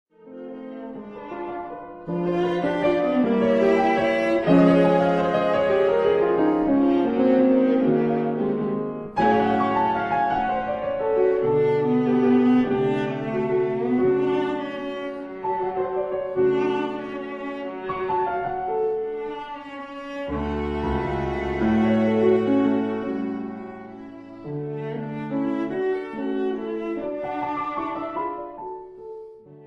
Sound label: Sechs Phantasiestucke For Viola & Piano Op 117 I Landler Tempo; Fuchs